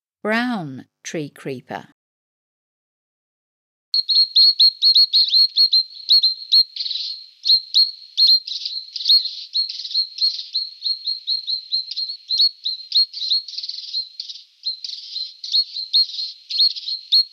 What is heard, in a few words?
Brown Tree Creeper